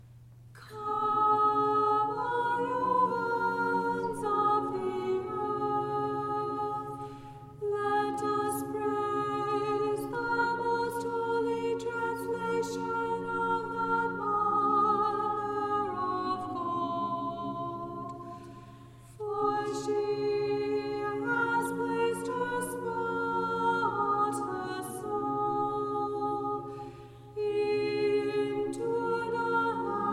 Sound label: Tone3 Kievan Sticheron Example Soprano